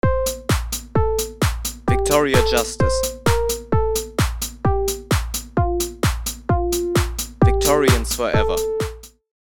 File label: Jingle; Victorians Forever